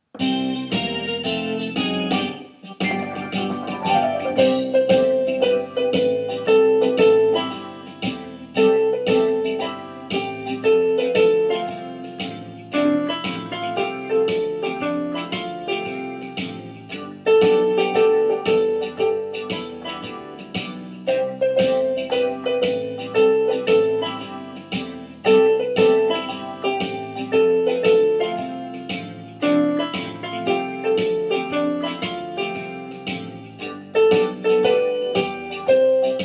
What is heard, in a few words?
slow